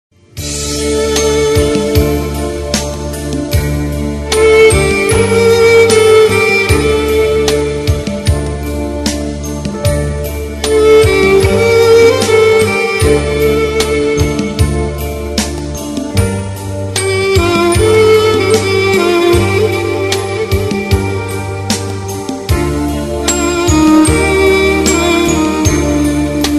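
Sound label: ringtone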